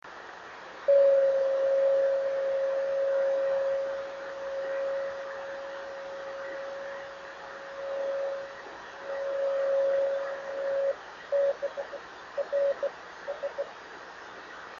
26625KHz

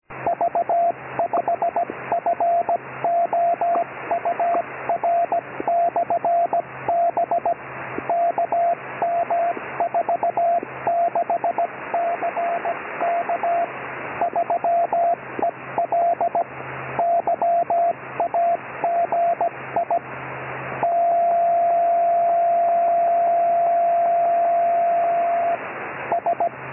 beacon; sv5four

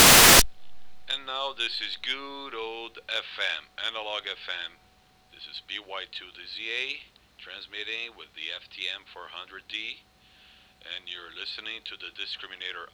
Analog FM Discr Out, yaesu

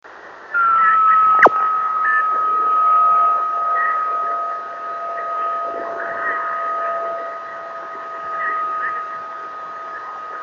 26750KHz

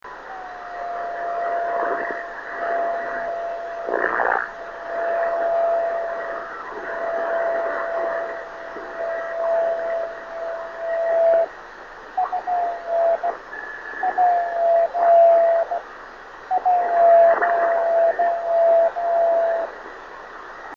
26570KHz, drift net beacons; FP1

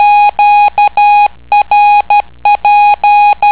qrp

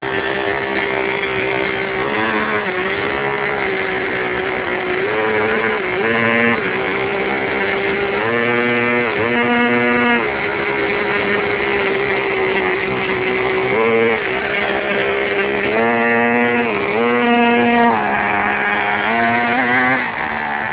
rc car

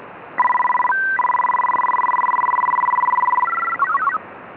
CROWD

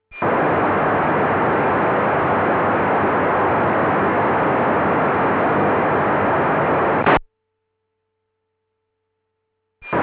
dstar undecoded

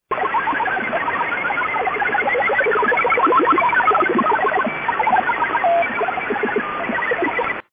UNID 12984kHz

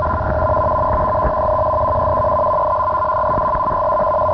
arq-m2; ARQ-2 (More Code)

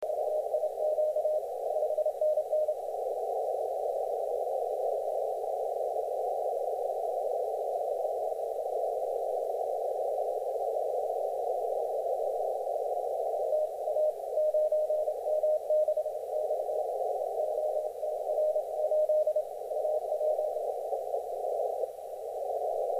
on 30m CW; 9M6XRO-8

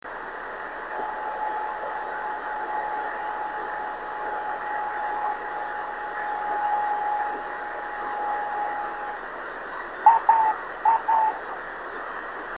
AA 28371KHz

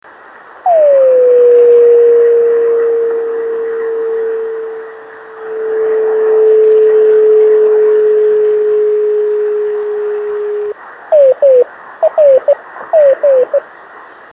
26820KHz

